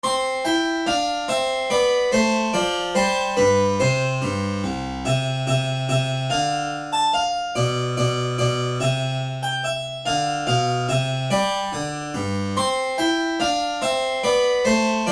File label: Minuet; L.Mozart